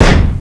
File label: Boss Mortar; 1997 MMX LAN PACK (win 95); Army Men